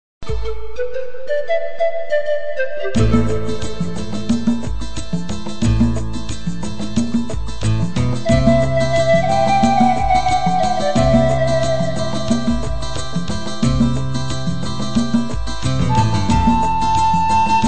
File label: Pan flute